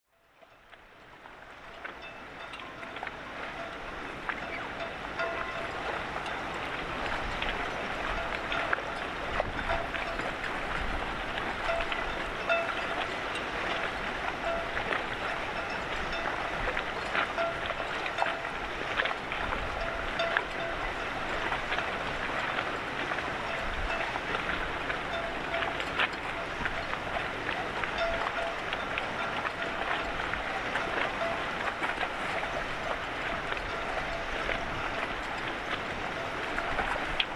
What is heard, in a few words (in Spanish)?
muelle antiguo puerto, colon; entre rios